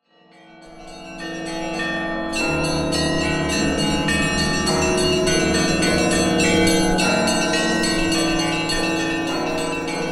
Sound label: vocals, Carol; Munich